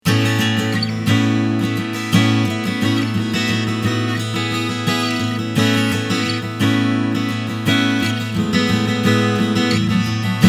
Ibanez AW80CE Mic

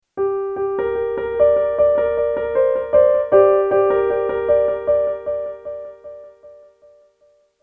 Zoom L12 DELAY FX; Send12 Tone11 Decay12

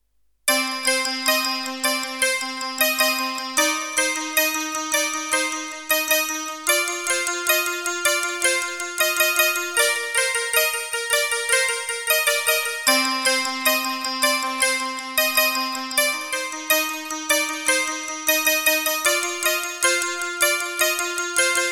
Octapad

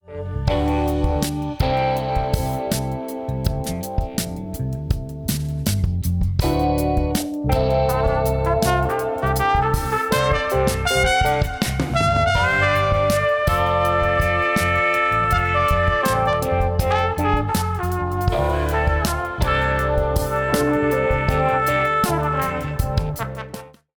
Chandler Zener Limiter TG12413 MIX LIMIT SC OFF

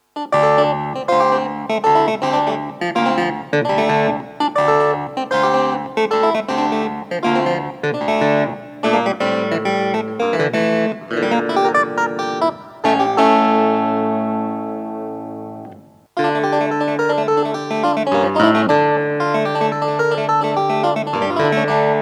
Hohner Clavinet D6 Treble

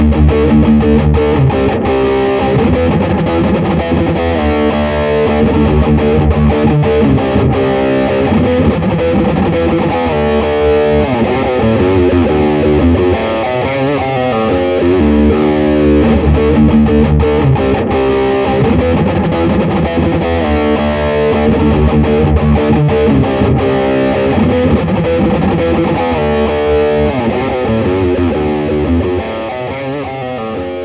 FW65 112 Dark Metal

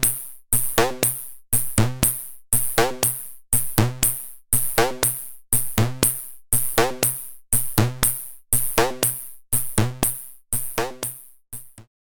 Studio Electronics Tonestar 2600; AR Outs To Outs

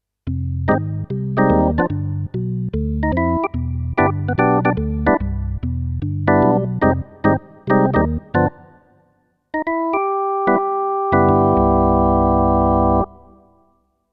Casio PX-S1100 Organ

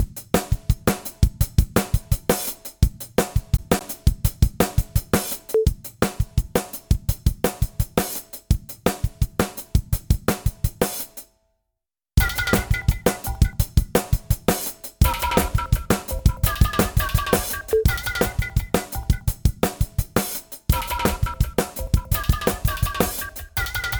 DRAWMER 1973 hi 10kHz GR 15dB 5dB

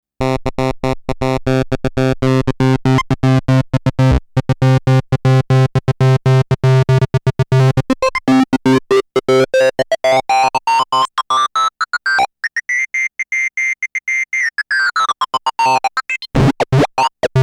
Korg EA-1 Osc Mod Sync

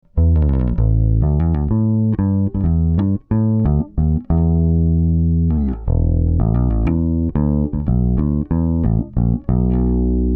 Bass DI 4 to 1 0 9 Att 05 Rel Drive 6 5

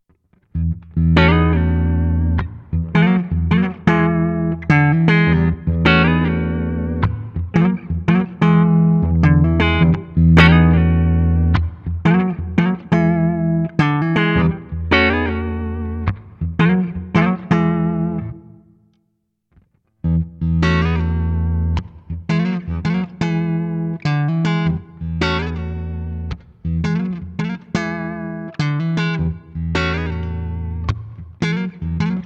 Hagstrom Ultra Max Neck Clean

